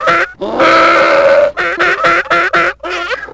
chirps; tusken; Star Wars Obi-Wan; XBOX

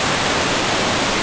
waterfall; Ambient; MX vs ATV Unleashed; XBOX

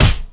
punch

